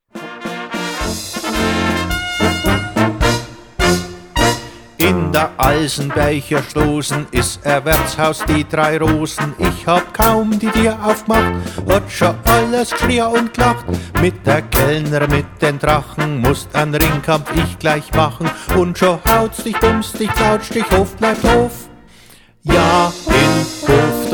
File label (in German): Blech